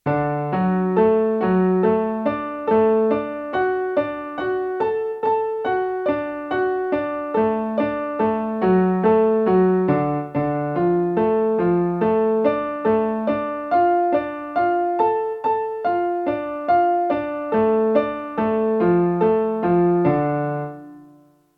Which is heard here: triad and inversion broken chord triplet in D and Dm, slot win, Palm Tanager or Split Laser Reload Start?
triad and inversion broken chord triplet in D and Dm